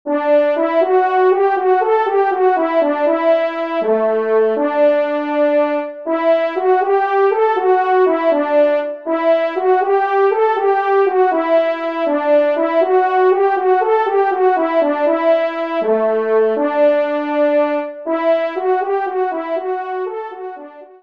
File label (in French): Fanfare; Loisirs du Chasseur 4 2e Fanfare; Pupitre 1° Cor; Henri Sénée